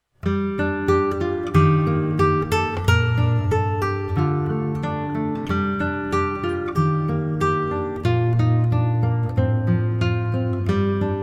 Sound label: Celtic Harp